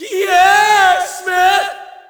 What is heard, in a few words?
Shout, audience; UFC Tapout 2